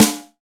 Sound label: SNR WHACK, Snares; Roland L-CDX-01; Snares 3; Sn Modules 3